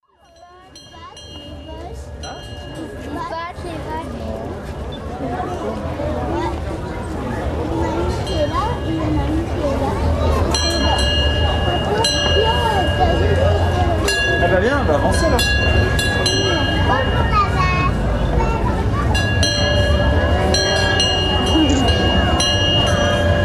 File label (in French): Cloches des ferrandaises; MZ-N710; fourmofolies Ambert